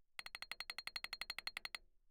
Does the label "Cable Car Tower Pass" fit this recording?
No